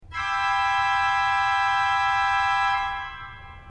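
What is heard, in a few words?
cornett C, organ; srednja vas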